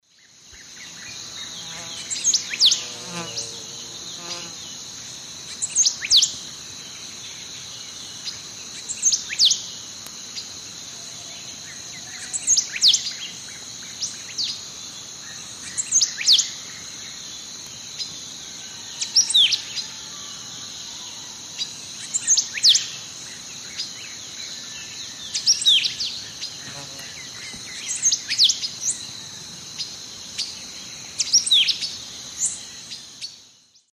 Euphonia chrysopasta, song; S. America; Eastern Lowlands

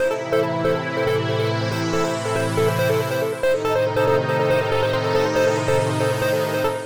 Pad; Dance

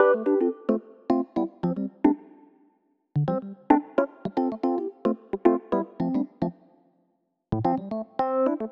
Rhodes; Rock; Keys

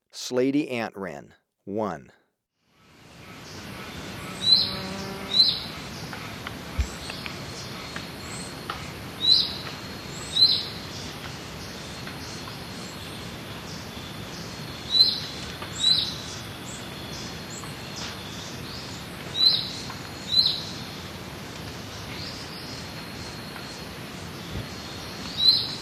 Slaty Antwren, Birds of Ecuador; S. America

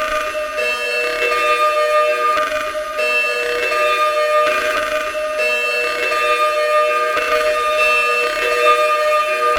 Boiling Strings, Electronica Strings

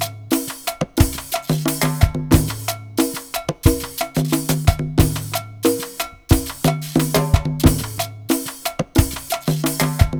Perc, Percussion Latin

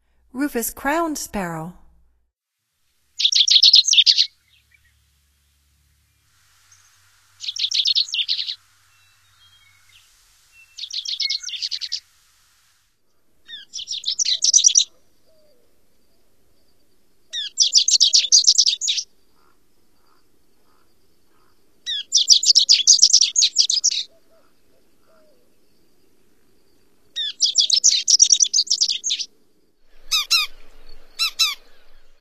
Rufous-crowned Sparrow; North America; Stokes

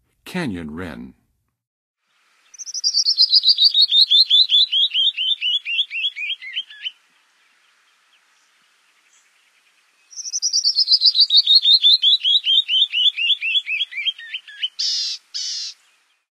Canyon Wren; North America; Western USA; Western USA Songbirds

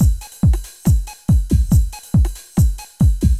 techno 140

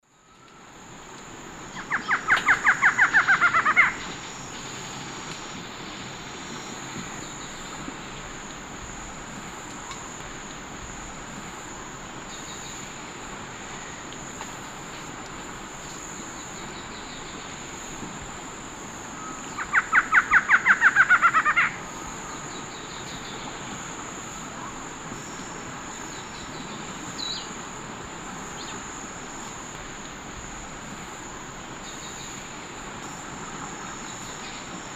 Thamnophilus doliatus, song female; S. America; Eastern Lowlands

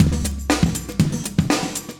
chique 120, loops, 120bpm; Sound Effect Library

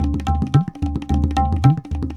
tabla funk 110

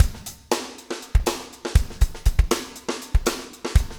breakout 120, 120bpm loops

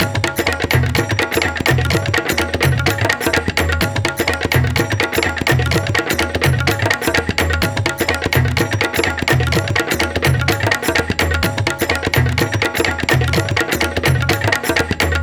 Percussion, Indian

